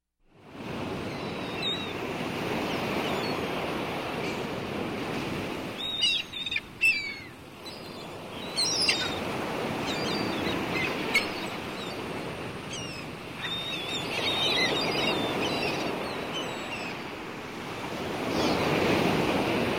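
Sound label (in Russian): чайки на фоне прибоя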